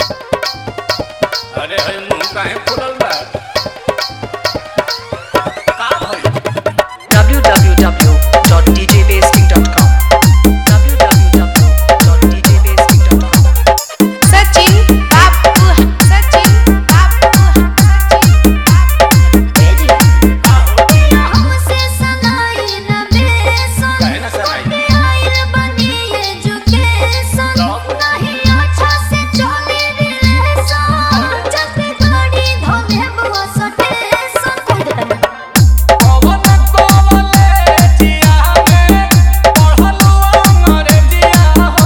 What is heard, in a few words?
Hard Vibration Mix